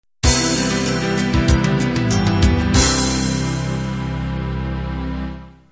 folk ballad end

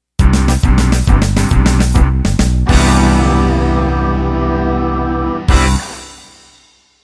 Blues Shuffle End

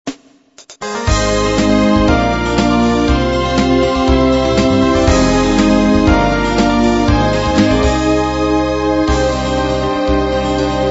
soft beat intro

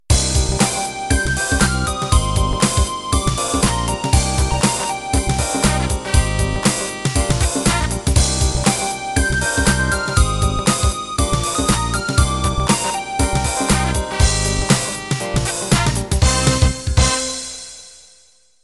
HipHop End

